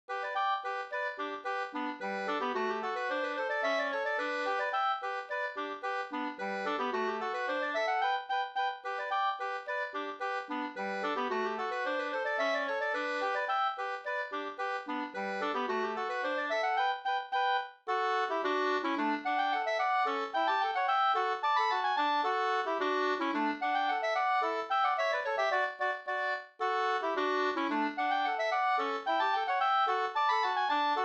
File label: polka